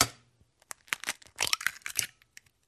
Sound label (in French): œuf cassé